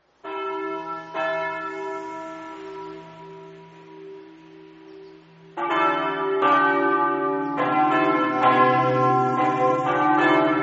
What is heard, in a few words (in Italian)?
campane; nimis